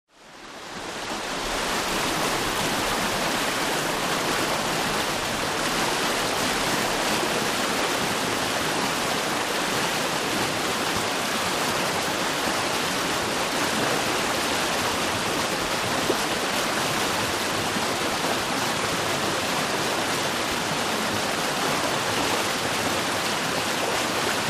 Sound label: Water Overflowing Fst R, rivers